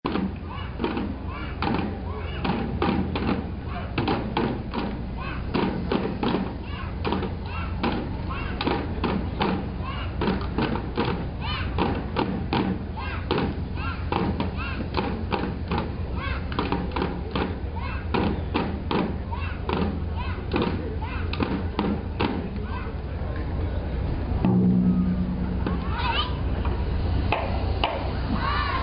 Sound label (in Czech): bubny deti, Japan